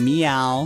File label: meow